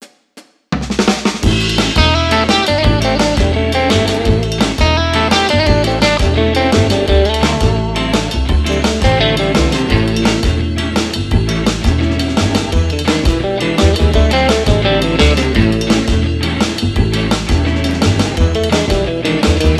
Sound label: cheerful entertainment dual solo; by burak sound bank; cheerful vol 8